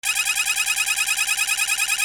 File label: ALARMS